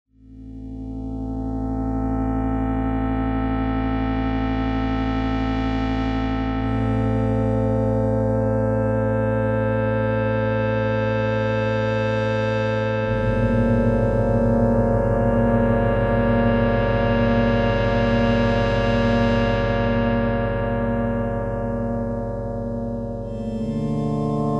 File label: Chorus